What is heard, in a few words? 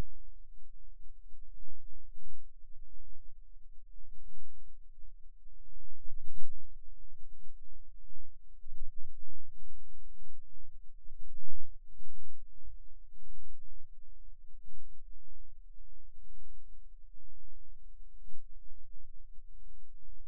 Rhythm Wave, eeg seizures